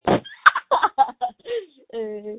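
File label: screams that feature laughter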